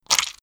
blood

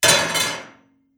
metal TRNKL

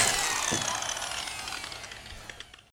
whir down

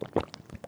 slurp SLRP

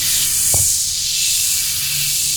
foam Spray Loop

